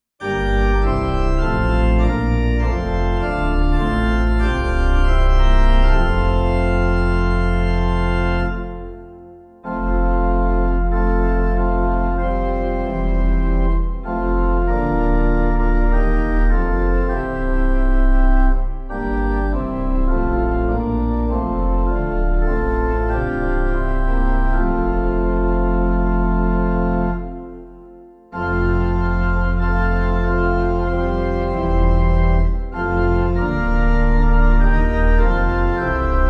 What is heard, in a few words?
Drop Drop Slow Tears Pipe